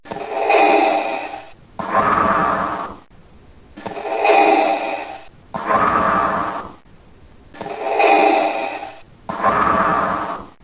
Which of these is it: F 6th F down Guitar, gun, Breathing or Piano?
Breathing